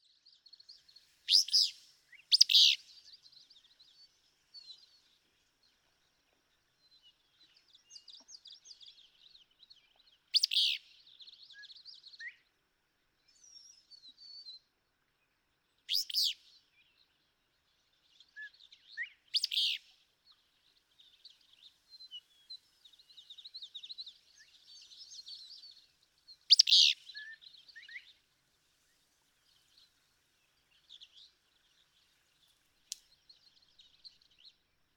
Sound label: Willow Flycatcher